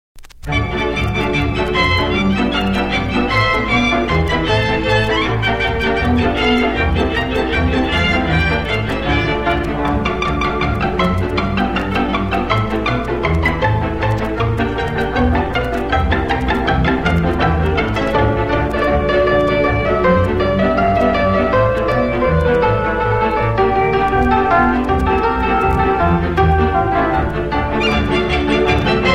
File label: Tuberosa Czardas